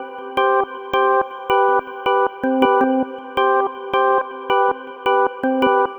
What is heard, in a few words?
House, ambient house; сэмпл клавишных